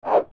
Hammer Swing; Swing the Megaton Hammer

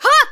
Cast; Hilda; zelda

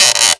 Creak; King of Red Lions creaking while cruising (#2 heard most often)

